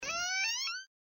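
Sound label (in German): Tür öffnen Sound; The Dream Machine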